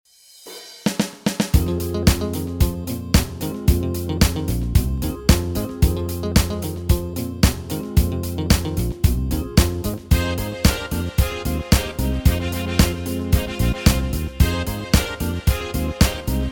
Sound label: medley, K800i